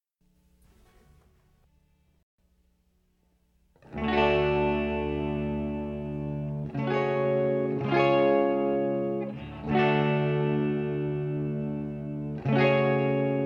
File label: guitar